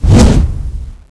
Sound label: zombi bomb normal; cstrike; CSO; LightZombie